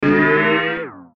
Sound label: Metallic Warble Bell